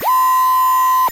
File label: robot arm moving